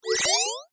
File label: science fiction machine small on activate beep